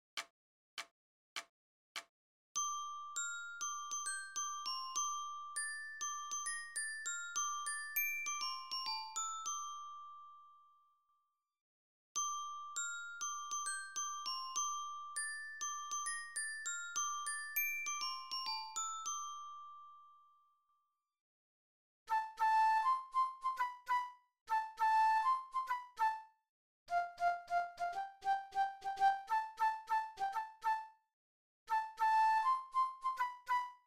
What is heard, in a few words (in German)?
Melodie, Spielmannszug